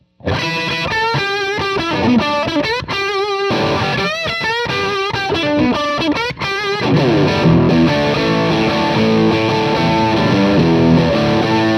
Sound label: lead